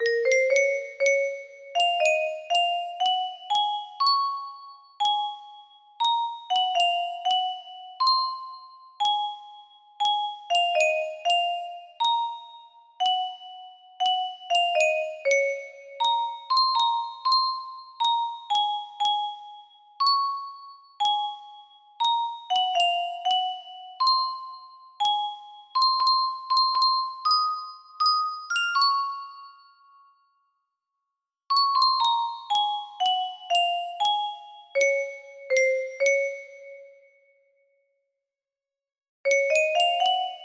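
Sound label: chorus, music box melody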